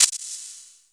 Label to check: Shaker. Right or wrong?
Right